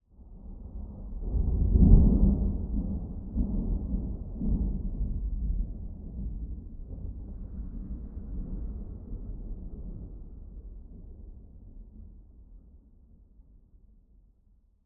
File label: Thunder distant short; boom library; Thunder And Rain